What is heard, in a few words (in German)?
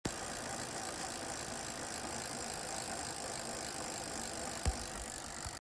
geraeusch